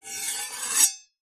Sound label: knifes medium scrape blades together